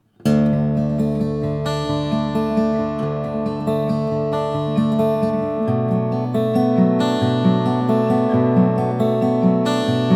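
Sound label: lowden custom f50c ancient bog, guitar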